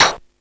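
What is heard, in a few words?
electronic percussion; ELEC.PRCS; East West; Global Sounds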